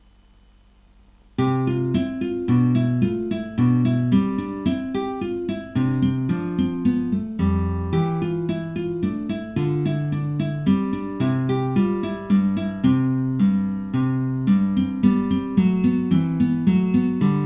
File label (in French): menuet guitare; Rameau, Jean-Philippe